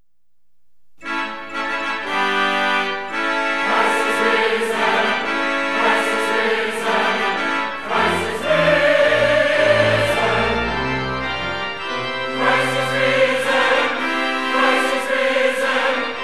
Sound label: Easter Fanfare; 2004 Chicago Trip